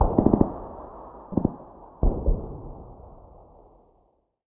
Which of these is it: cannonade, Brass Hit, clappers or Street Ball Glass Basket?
cannonade